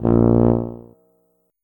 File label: tuba note